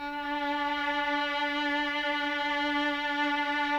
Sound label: CLN STG E-3, CHAMB STR; Sonic Reality; Vintage Timetraveler